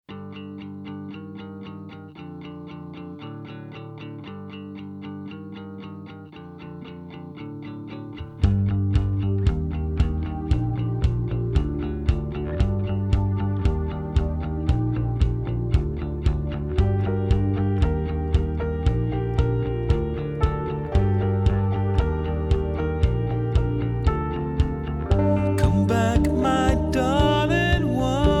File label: Minor Key Sonata (Analogue)